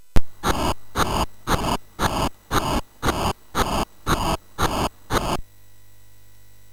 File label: Final Synth Sample, MOS6581R3; hvsc